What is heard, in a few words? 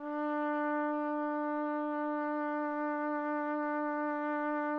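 trumpet; Roland - LA Composer vol. 3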